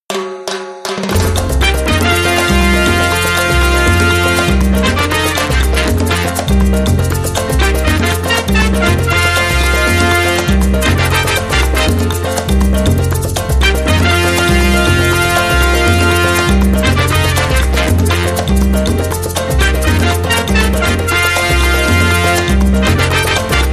Big Band Mambo

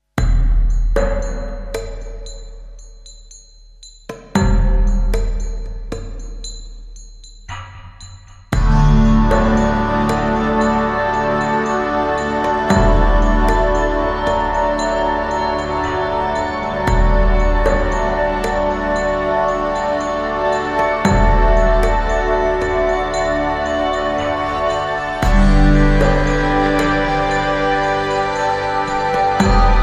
No Flute

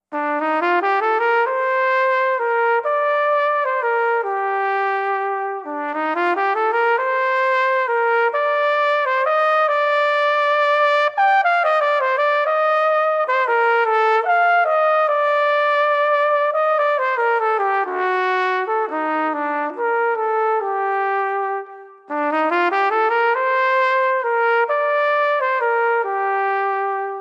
Gentle Waltz

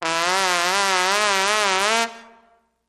Trombone Gliss Up With Medium Vibrato

